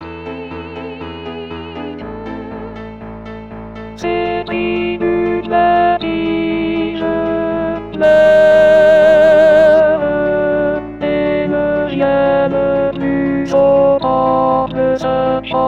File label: cantilene sop; en français; Gallia Lamentations (Jérusalem )